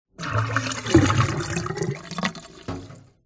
household kitchen sink metal water drain plug hole short gurgle